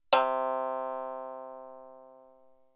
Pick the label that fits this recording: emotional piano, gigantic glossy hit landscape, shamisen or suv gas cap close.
shamisen